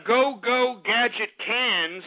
gar attack; garg

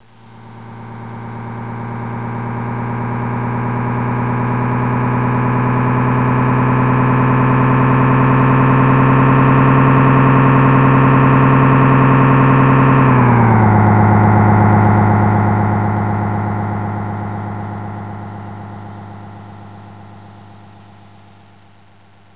truck

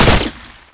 fire dart

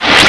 teleport out

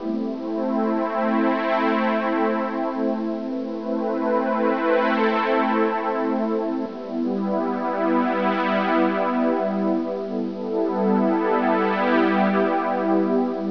Song

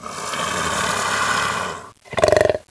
bear idle, bear

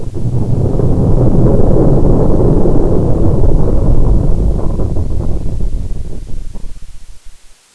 rumble

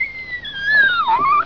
die, houndeye

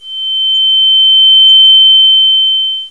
m82a1 earrape

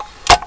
m32 close, weapons

